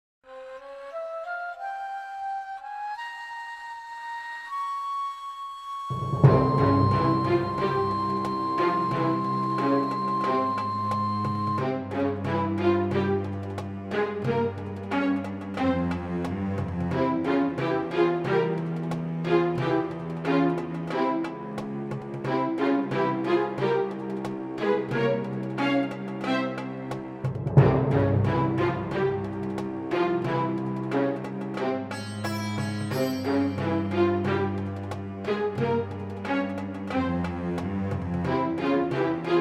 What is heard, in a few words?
desert buddy appears